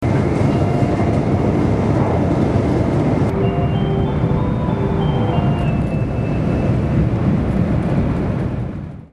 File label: chime